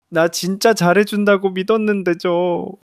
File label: crying